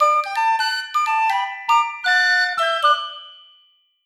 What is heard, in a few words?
Level Complete; Gabbuchi; ps4 switch windows